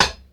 Studio Hi Hat F# Key, Royality free hat sound sample tuned to the F# note. Loudest frequency: 2819Hz; hat samples